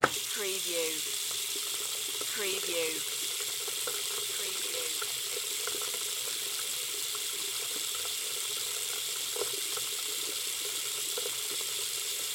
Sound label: Bathroom Sink On Run; sound effects bible